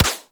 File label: Weapon Punch Bow Common One; Warframe; Weapon Punch Layers